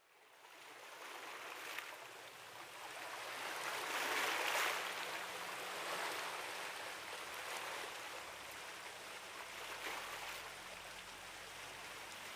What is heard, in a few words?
Ambience; Music Unlimited; PSP system sounds